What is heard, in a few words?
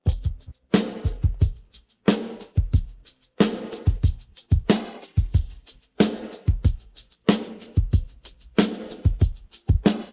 drums